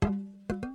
Geräuschewerkstatt Bongo Trommel; Klangexperimenten

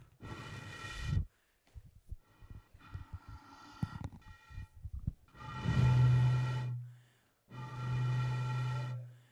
pusten in Rohr; Pusten in ein Rohr; Geräusche zu den Klangexperimenten

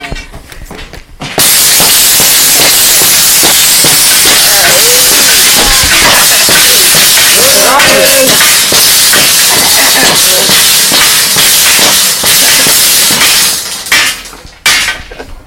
zischen klopfen; Zischen...klopfen (sehr laut)

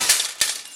Geräuschewerkstatt scheppern kurz; Klangexperimenten

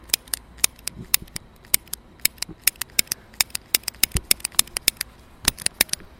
Klicken eines Feuerzeuges

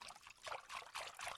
Geräuschewerkstatt Wasser planschen kurz; Wasserplantschen kurz; Klangexperimenten

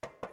klopfen kurz; Geräusche zu den Klangexperimenten

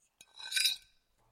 Kratzen auf dem Teller; Geräusche zu den Klangexperimenten

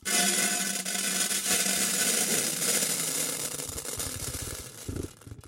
Geräuschewerkstatt Reis Hagel; Klangexperimenten

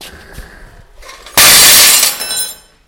metallisches Klirren

